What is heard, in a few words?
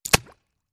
Bullet Impacts Water